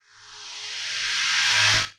Uplifter

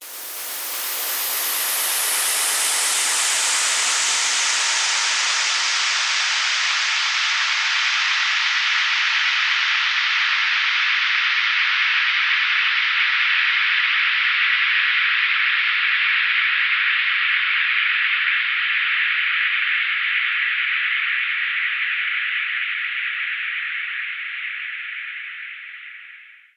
Downsweeps

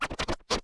Cuts, Vinyl Record Samples

